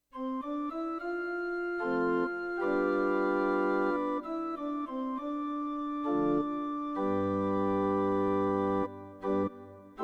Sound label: S51 Organ